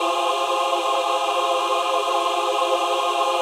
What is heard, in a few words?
choir fx